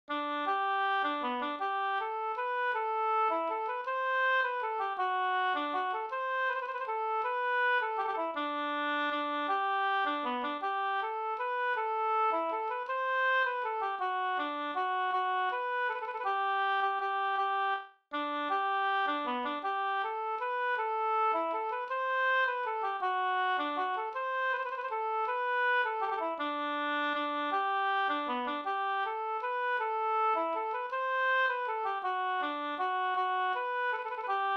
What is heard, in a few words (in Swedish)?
vals Gdur